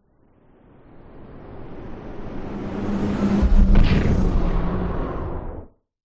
science fiction pass by whoosh fast into another dimension; zapsplat